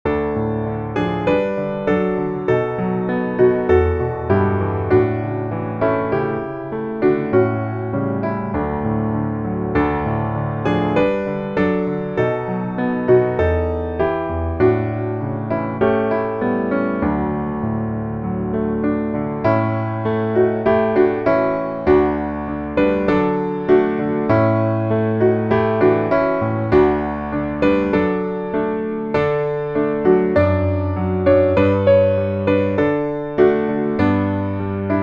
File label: piano